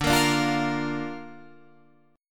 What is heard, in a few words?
D Minor Sharp 5th D down Guitar Standard; Dm#5 strummed; JGuitar